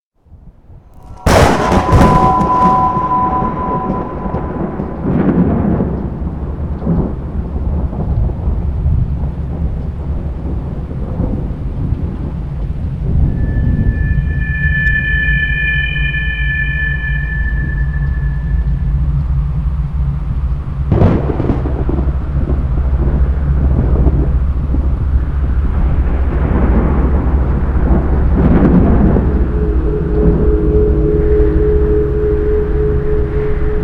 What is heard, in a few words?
windstorm and thunder; Halloween Nightmare CD Sound Effects